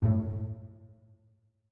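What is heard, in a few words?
orchestra; Masterbits - Switch FX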